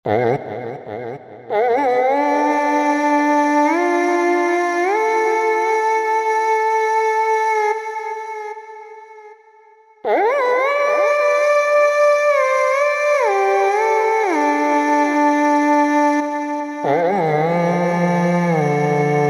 Oriental Vox, Roland V-Synth; Factory Patches

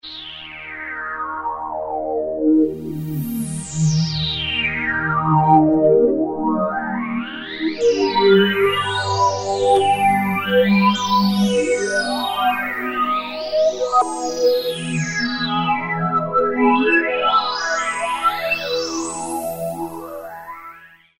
Roland Alpha Juno-2 Cosmo Sweep; Factory B

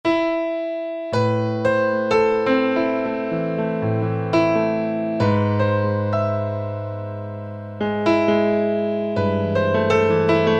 Piano Keys Synth, Yamaha A4000; Piano - Keyboards

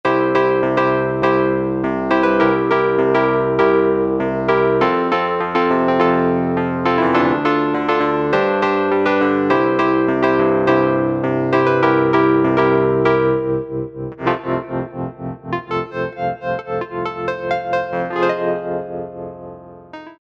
Wurli

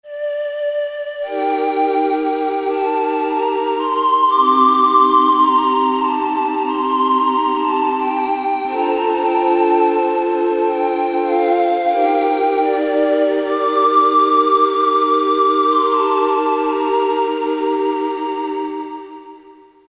Pan, Woodwinds, Korg DSS-1

